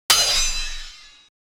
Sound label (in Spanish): efecto de bloque fuerte, sword